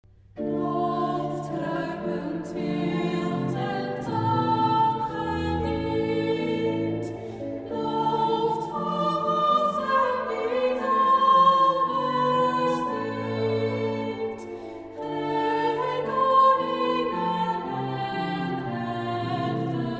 Psalm vocaal

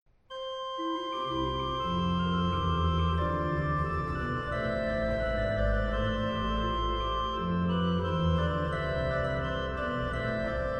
Orgelvers

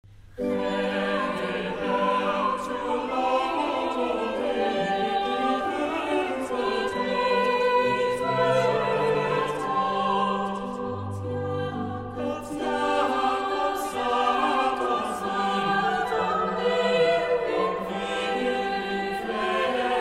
Psalm vocaal

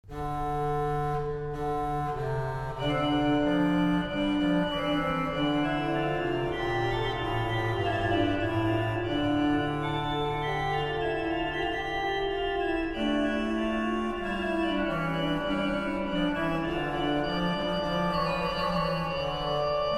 orgel

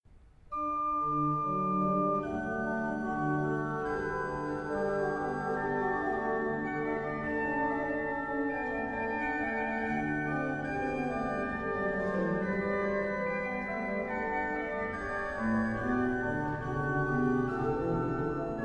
Orgelcompositie Psalm

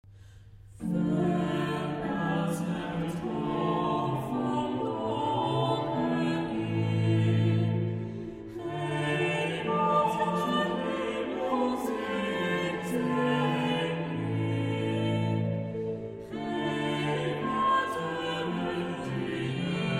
Psalm vocaal

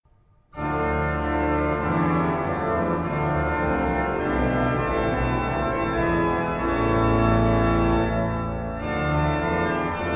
Orgelpartita Psalm Koraal

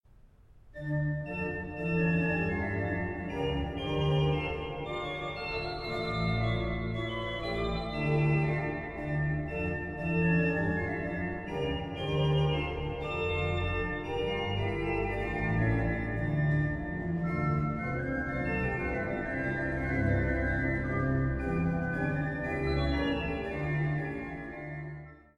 Orgelpartita; Variatie 2

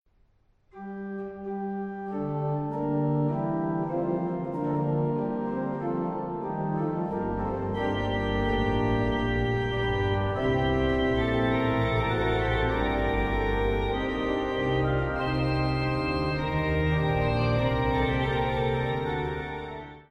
Orgelvers Pedal

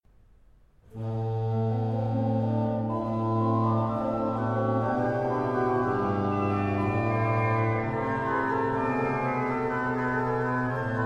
Orgelpartita; Psalm 46; Variatie 2

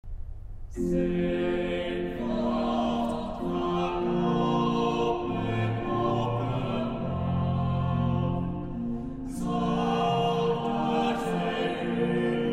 Psalm vocaal

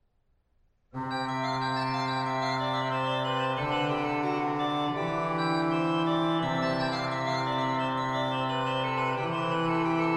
Orgelvers

